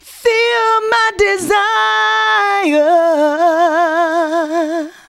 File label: FEM HO, Female Hooks; Sound & Vision - Gigapack II CD 1